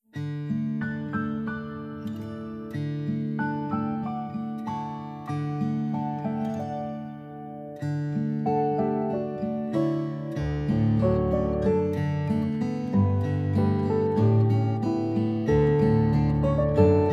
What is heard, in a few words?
piano guitar